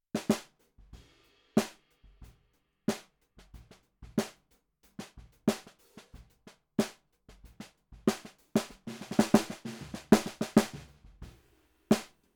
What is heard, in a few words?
SNR Bot